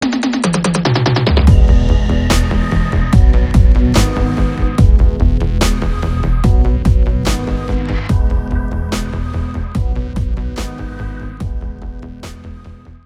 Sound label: fill